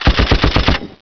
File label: machinegun; Bounce Ball